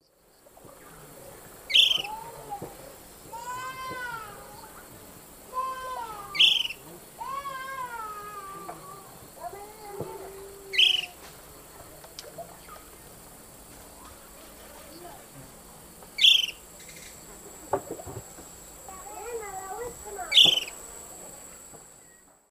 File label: Myiarchus ferox, dawn song; S. America; Eastern Lowlands